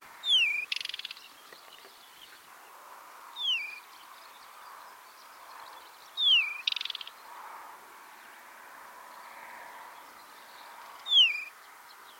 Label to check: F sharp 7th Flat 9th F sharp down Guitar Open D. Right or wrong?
Wrong